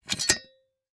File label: Sports Shovel Metal Compact Shovel Folding In